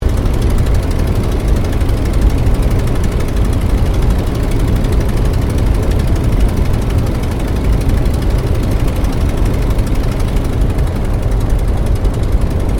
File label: AIRCRAFT RYAN PT22 LAND INTERIOR; 1942; Airplane Sound Effects; Airplanes (Sound Effects:Vehicles)